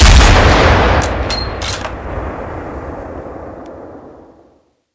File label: cheytac fire